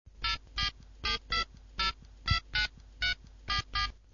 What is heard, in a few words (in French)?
sonnerie bip touche